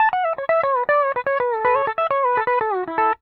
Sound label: PD LOOPS 120, Whole Lotta Country